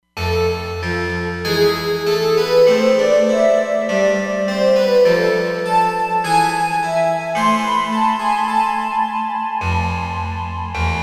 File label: Side Band Bell, Roland Fantom XR